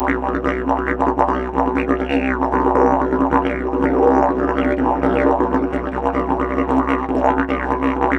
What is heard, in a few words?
C BURBLE, Didgeridoo; Big Fish Audio - Didgeridoo and Other Primitive Instruments; BURBLDRONE